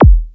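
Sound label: bass drum blip; 300 Drum Machines; Yamaha Motif ES-Series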